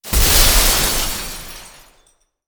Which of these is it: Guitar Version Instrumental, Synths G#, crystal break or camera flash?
crystal break